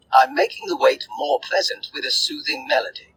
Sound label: melody